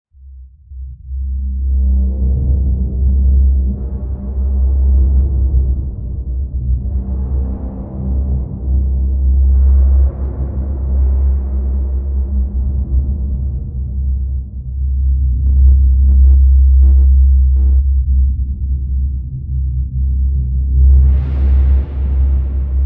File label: interstellar turbulence galactic roar; fnx sound